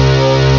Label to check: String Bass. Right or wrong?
Right